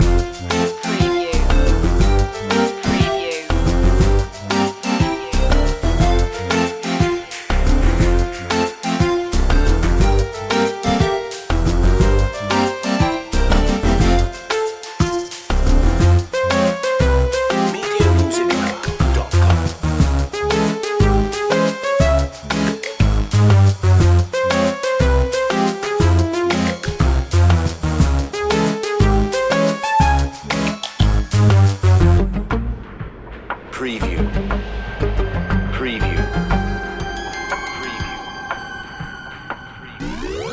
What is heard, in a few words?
retro video game ferris wheel long looping